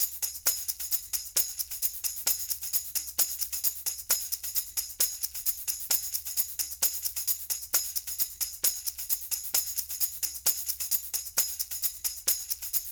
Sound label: tambourine; super crush k.o.